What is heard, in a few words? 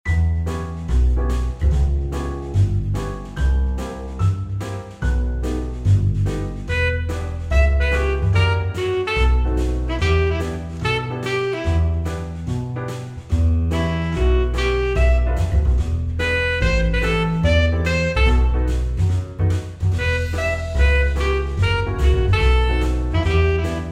MELODY